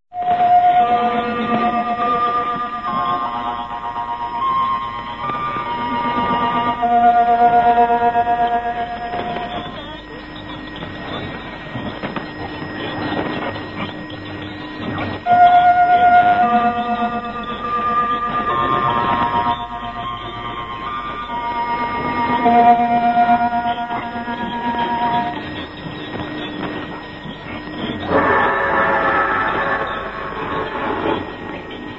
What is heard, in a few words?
interval signal; rub ds c1982